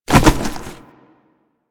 Hit Ledge, Foley; Warframe; Tenno

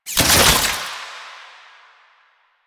Soldier Zipline Stop; Enemies; Soldiers; Warframe; Lasrian

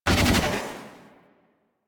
Gun Beam End; Warframe; Thano Tech Arch Gun

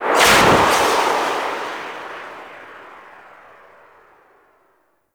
Archwing Healer Engine Boost Slow Short, Vehicles; Warframe

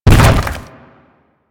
Colossus Hand B; Warframe; Colossus Boss

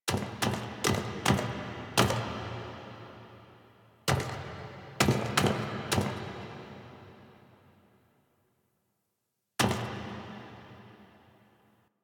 Sentient Throne Room Steps; The New War; Ballas

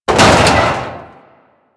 Arachnoid Camper Step Metal Debris; Warframe; Corpus

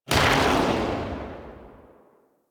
Cowgirl Pistol 2D Tail C; Tenno

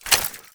Uzi Reload; Warframe; Weapons; Tenno; Prime Tenno Uzi

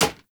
Bow Impact Default; Weapon Impacts; Player; Bows; Hunter Bow; Environment; Envoy Hunter Bow

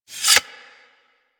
Dagger Sheath; Warframe; Weapons; Dual Daggers

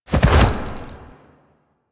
Horse Jump Heavy; Foley Actions; Warframe; Vehicles; Duviri

